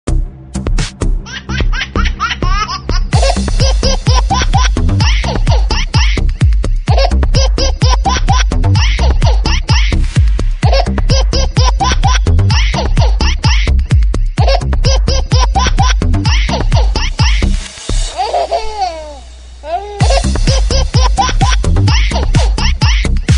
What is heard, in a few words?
Baby laugh Mix